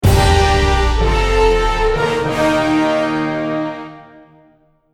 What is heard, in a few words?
Sting; Sitting Duck Music; Good Morning Britain